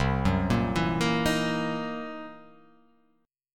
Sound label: C 7th Sharp 9th Flat 5th C; arpeggiated; JGuitar